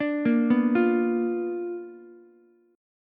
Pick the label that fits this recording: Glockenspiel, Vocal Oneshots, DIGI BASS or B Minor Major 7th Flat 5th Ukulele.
B Minor Major 7th Flat 5th Ukulele